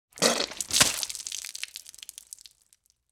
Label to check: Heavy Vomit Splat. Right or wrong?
Right